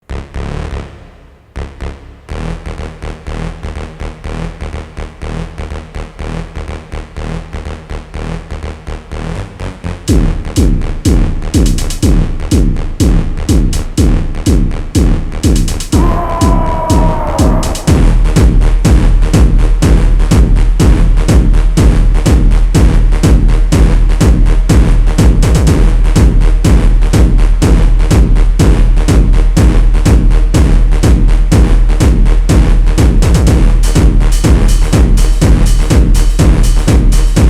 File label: phonk